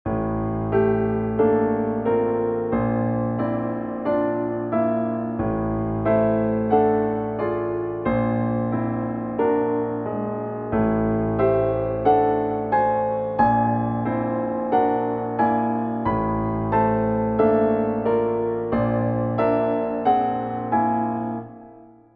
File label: whole tone tuned